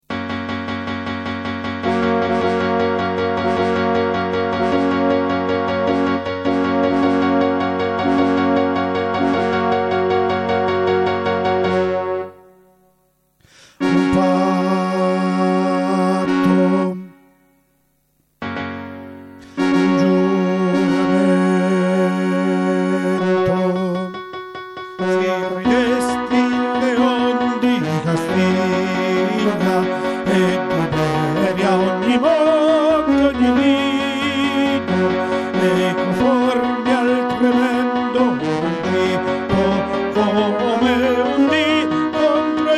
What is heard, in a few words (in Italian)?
contralti; Si ridesti il Leon di Castiglia